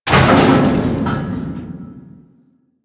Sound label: Submerged Metal Barrel; Ambience; One Offs; Warframe; Quests; The New War; Drifter Camp